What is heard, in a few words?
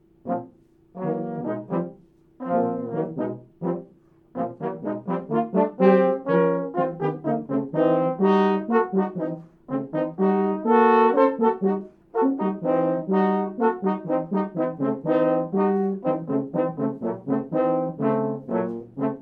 low brass old setup, Horn; technology; Berry College in Rome